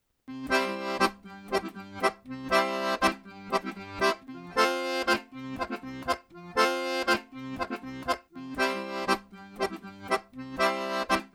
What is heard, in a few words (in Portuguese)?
Acordeon Arrocha Simplificado